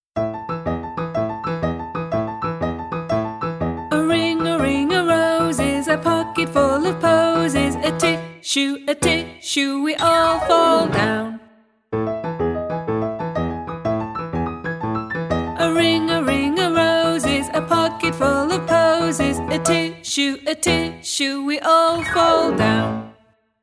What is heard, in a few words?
Ring A Ring A Rosey